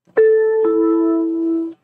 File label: destination chime; translink